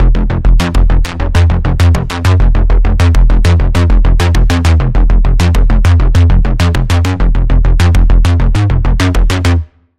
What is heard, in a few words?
Pulse, Sylenth1; Pulse - Messianic Impulse; Routed Sound; Sylenth1 Expansion - Quantum Enforcer Vol.1